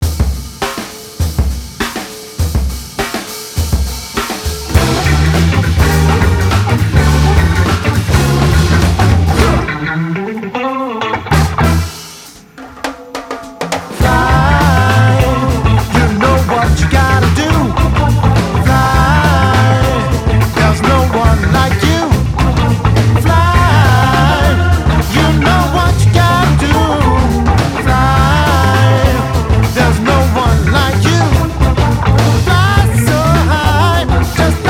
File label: Drums Blues